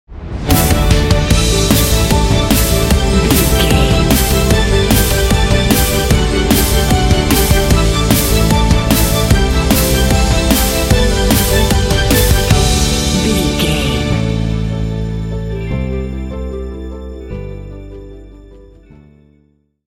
orchestral rock modern classical hybrid sting

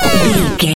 retro damage hurt ouch

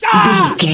voice male battle shout short

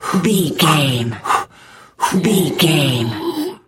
voice male effort breath lift

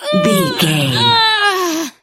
voice female death

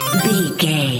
collect item jingle

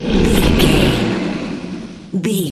troll monster breath

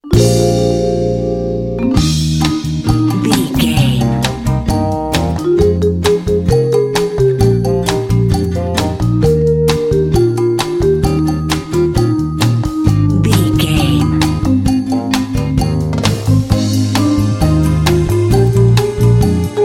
quirky cha cha comedy

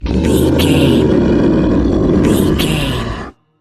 troll monster growl

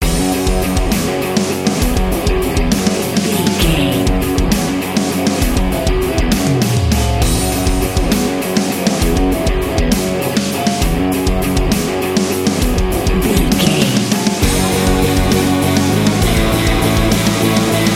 Sports Rock, Pop Rock, Rock, Hard Rock, Metal

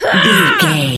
voice female battle shout short

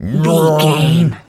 zombie voice grunt

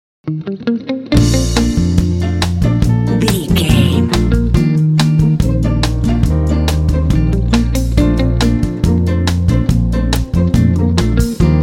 sexy and playful flirting time

